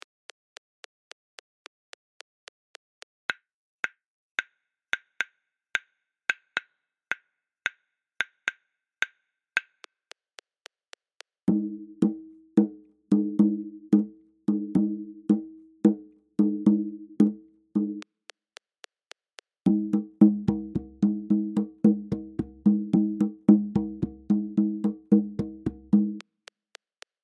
Batakato 110; Afrika

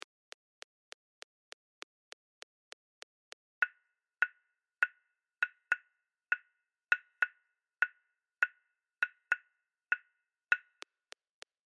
Mahi 100bpm; Lateinamerika

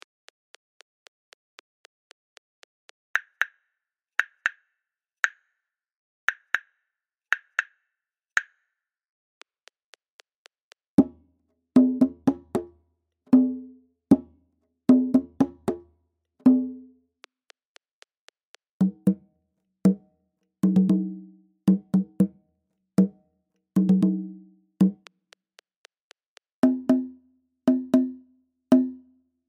Warba 115bpm; Afrika

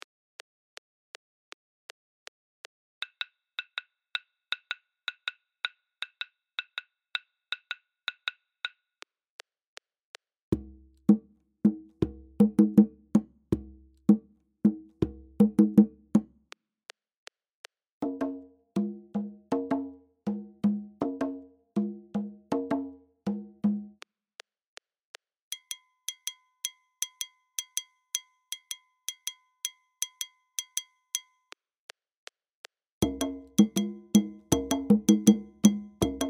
Djambadon 160bpm; Afrika

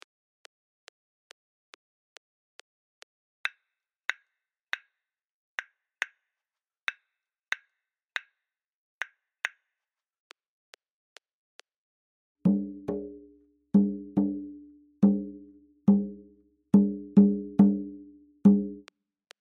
Kpanlogo 140; Afrika

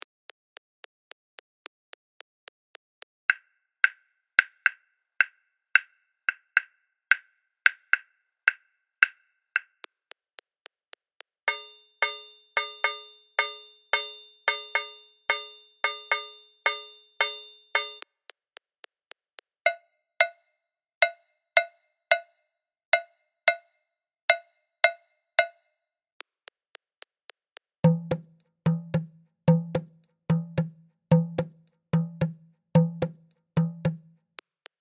Bembé 110bpm; Lateinamerika

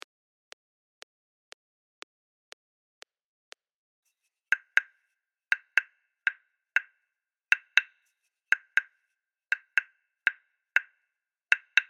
Iyesa 120bpm; Lateinamerika

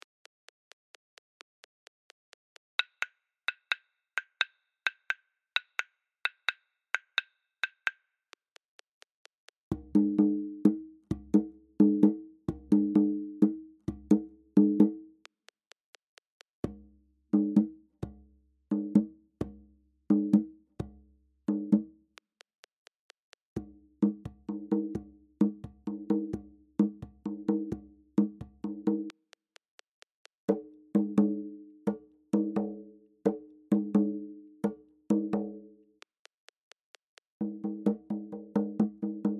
Tiriba 130bpm; Afrika

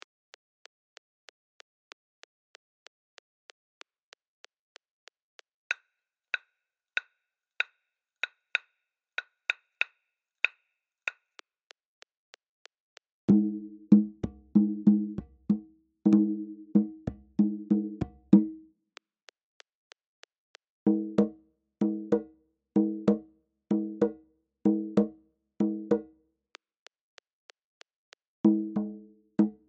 Koreduga 95bpm; Afrika

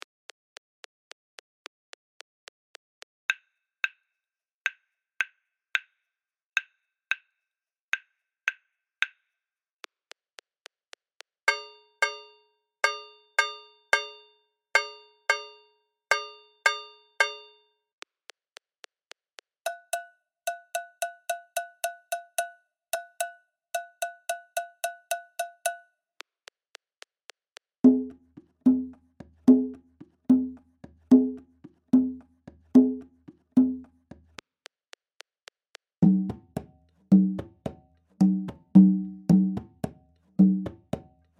Abakua 110bpm; Lateinamerika

